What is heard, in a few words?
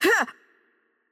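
Attack Light, Portuguese; bloom minigame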